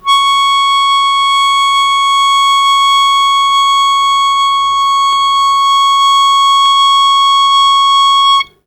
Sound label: Long Sustain Aa C#2, Harmonica; Zero G - Harmonica